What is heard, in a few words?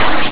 hit